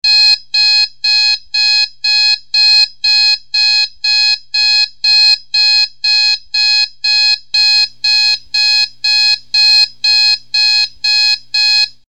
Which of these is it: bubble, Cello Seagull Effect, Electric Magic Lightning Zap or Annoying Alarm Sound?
Annoying Alarm Sound